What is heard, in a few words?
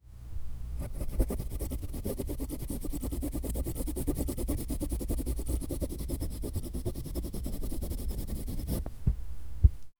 writing